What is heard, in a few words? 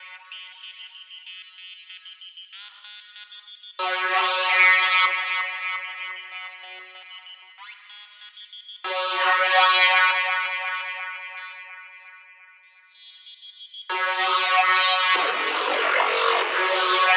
Synths and Reese